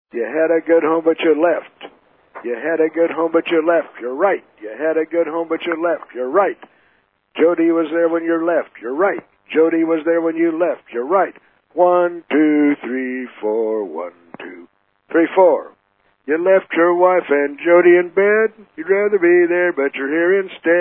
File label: Jody Call, Sound Off (The Duckworth Chant)